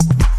slice

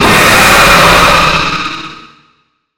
hang girl screamer; phantom

